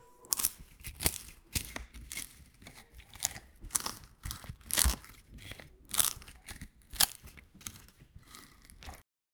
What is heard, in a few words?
cutting and chooping vege